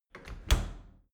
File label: dry cleaner close soft; maschinen; tueren